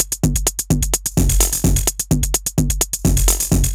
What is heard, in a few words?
hat loop, 808, clippy, 128bpm; GJaxx